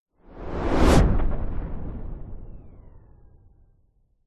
sound design cinematic whoosh fast into collapsing air tail; zapsplat